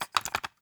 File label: Cassette Rattle